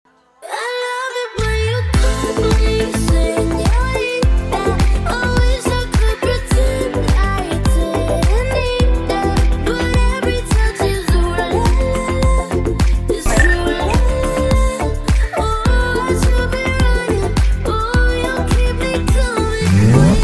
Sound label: romantic english ringtone